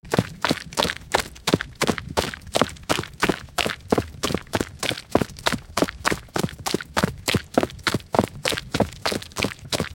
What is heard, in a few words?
person running fast in na, mud